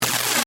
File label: Electric Short; Miscellaneous Electronic (Sound Effects:Electronics)